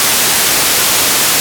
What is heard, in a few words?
White Noise C2; Digital Sound Factory Xtreme Lead